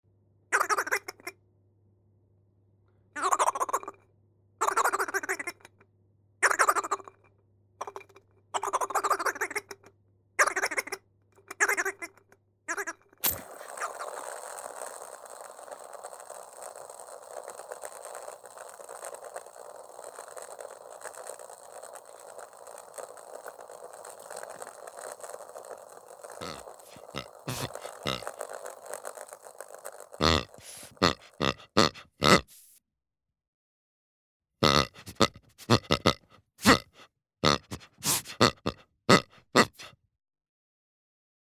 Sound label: toys